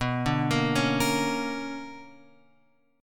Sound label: B Minor Major 9th; BmM9 Chord {7 5 8 6 X 6} arpeggiated